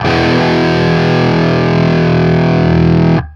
5TH E, Guitar; East West; Steve Stevens Guitar Samples Collection

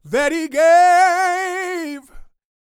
Vocal Gospel; Vocal Planet Vol1 Gospel

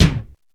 bass, AKAI XE-8; Greytsounds

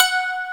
YANG-CH'IN; AKAI S6000 CD-ROM - Volume 1

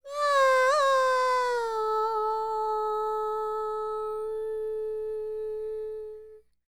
World Voices, INDIA; ILIO; Vocal Planet

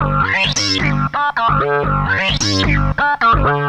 Analoop, Industrial Analog

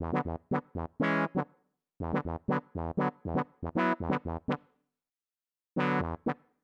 120 riff 4 bars E minor, Keys, Nu Jazz House; PowerFX

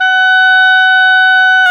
OBOE, Orchestral Winds, Oboe mf f

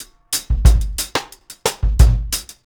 RG90 DRUM, REGGAE

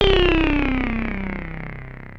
Anasound Long C, Industrial Analog Single Notes; Sonic Foundry (Sony Creative Software)

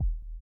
SONAR L; Spectrasonics; Metamorphosis

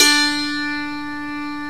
DULCIMR KOTO; OMI - Universe of Sounds

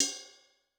Cup, Cymbal; Yamaha DTXpress-II

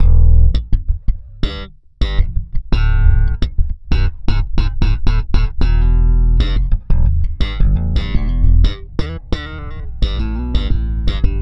Slap, Bass; Bass X

